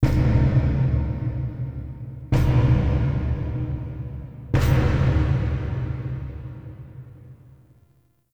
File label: Knocks Large; Warframe; Ambience; Entrati